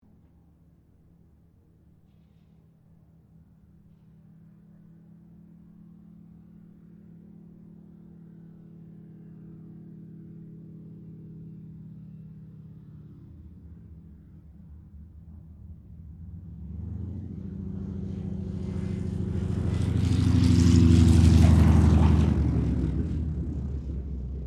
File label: AIRCRAFT T-28D TROJAN LAND; Airplanes; Sound Effects:Vehicles